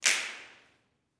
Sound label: SNAPS, Gospel